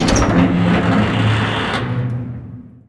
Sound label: Door Metal Open; Doors